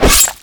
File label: attack; Anti-Mage